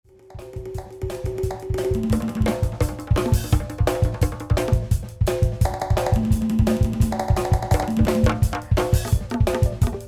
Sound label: jung, drummer